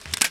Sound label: draw uzi; Worms3D